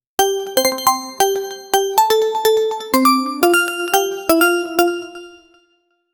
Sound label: a digital sound in F